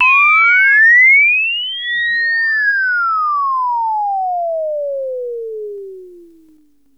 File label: Moog Pro FX; Vintage Voltage